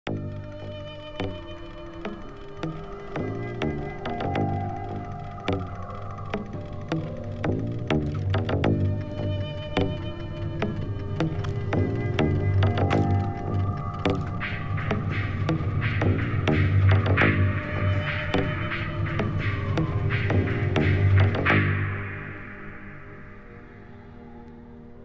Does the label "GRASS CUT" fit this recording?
Yes